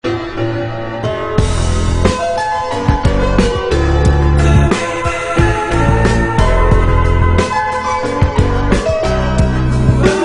Backseat Tune; Sony Ericsson W810i

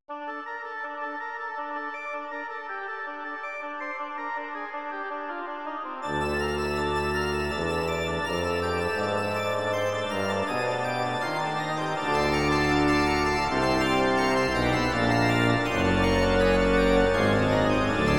church at night; Arphiola ringtones

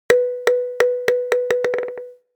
Pong pong, phonetones Nokia

